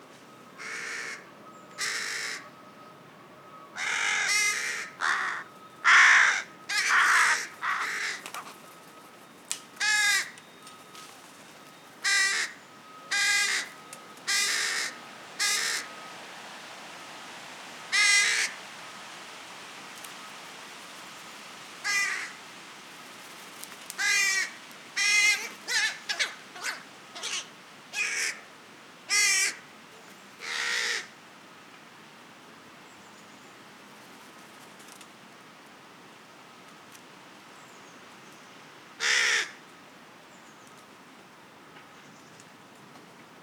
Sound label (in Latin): Corvus corone; SETE